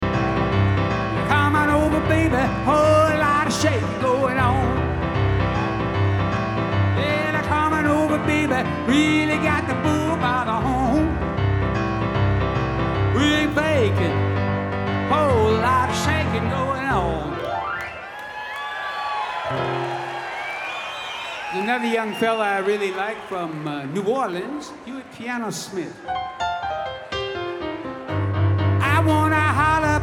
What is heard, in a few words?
Piano Moment